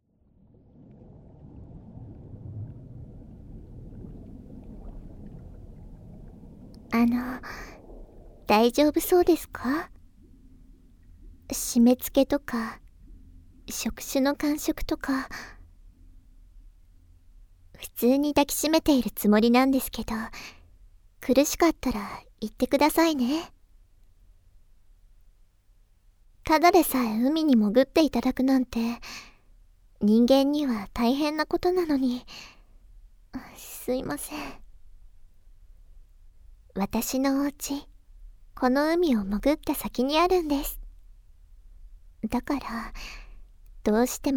深い浸食耳舐めと触手でねっとり全身責め(乳首責め・耳舐め・手コキ＆触手コキ)効果音なし, ASMR

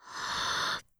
ブレス ロング

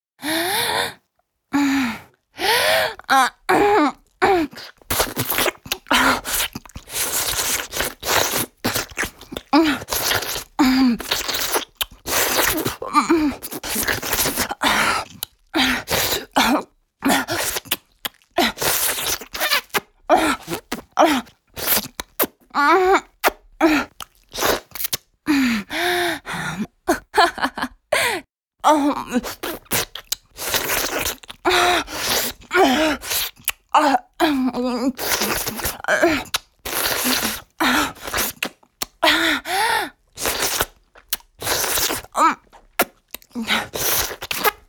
フェラチオ(強):頭を前後に激しく振って陰茎を深くしゃぶる; 実用ボイス抜粋集

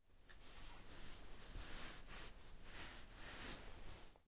衣擦れ 軽く動く; 夏実の膝の上で衣擦れ

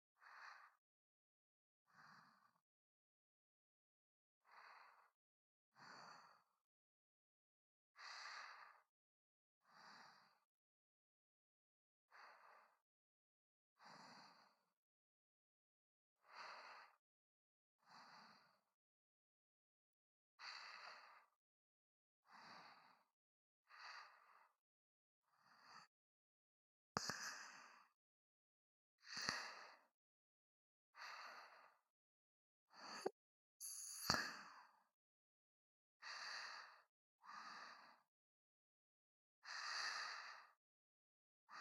寝息オンリートラック, ASMR; スイーツカノジョ -いちご大福の初恋

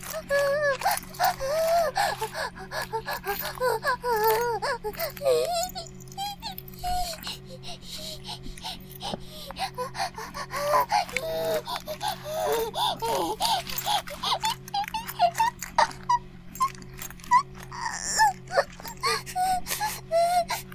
バイブ放置

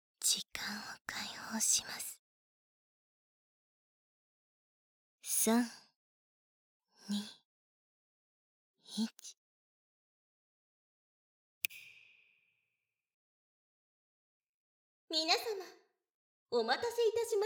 激しい耳舐めと連続絶頂; 絶頂ショーのクライマックス; 効果音なし

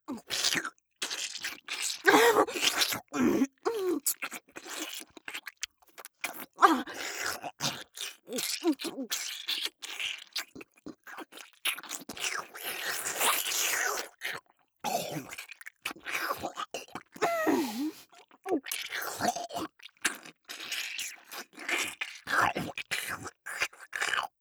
BGV(フェラ); Ｗキャスト

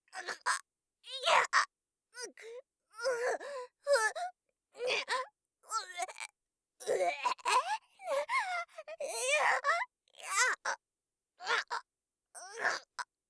"あがっ！"; 効果音なし

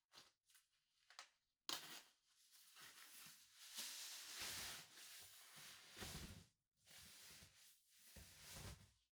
ズボンを脱ぐ; 3DIO; 色々な動作

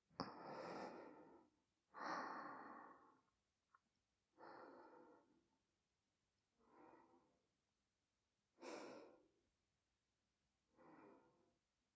添い寝(左側), ASMR; ひかると添い寝(左側)

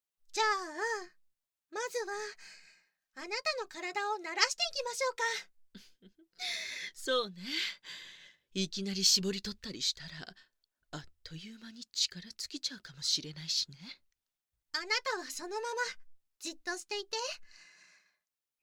ダブル耳舐め手コキ, ASMR

